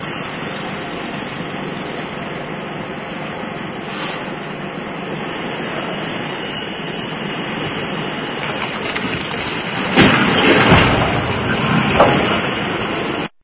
Jackhammer Sound

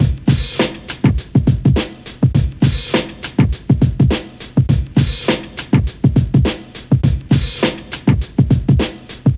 Old Rap Loop

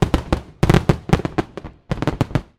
Fireworks Bang Sound

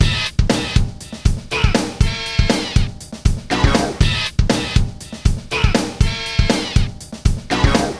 Hip Hop Remixed

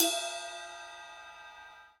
Ludwig Ride Lite

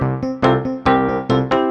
Gospel 140

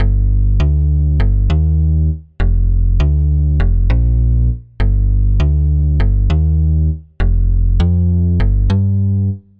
Low Q Bass Loop

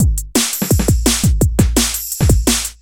Great DnB Beat 170

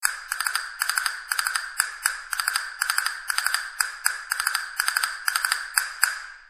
Castanets Latin

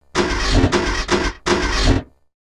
Car No Crank Sound

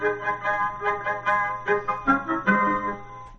Jingle Bells Orch

